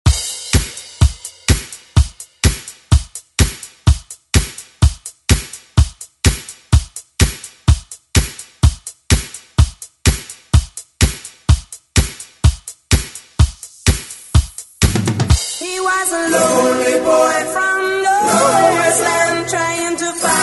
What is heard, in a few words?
80s Re Drum; DJ Mhark